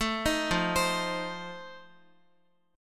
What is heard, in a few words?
F 7th F down Guitar; arpeggiated